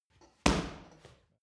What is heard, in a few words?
punching bag kick hard